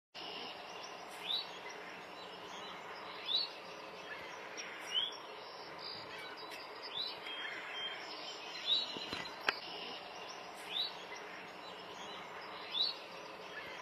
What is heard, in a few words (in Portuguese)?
canto do capuchinho multicor